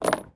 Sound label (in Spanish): cadenas end; yesterday origins gamerip 2016 switch ps4 pc ios macos